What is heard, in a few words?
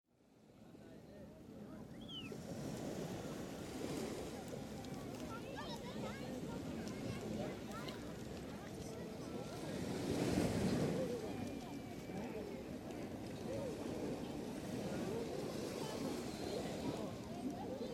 Surround day at the beach, Slow ocean waves wash in; occasional birds tweet, children and adults play and swim; Ocean Sound Effects